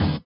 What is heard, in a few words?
SWUSH; Spectrasonics; Metamorphosis